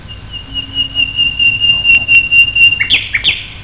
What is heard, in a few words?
birdsong